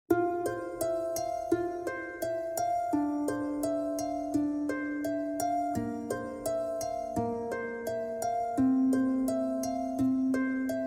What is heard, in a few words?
Malayalam Music Video Vocal Removed HD Karaoke Sample Track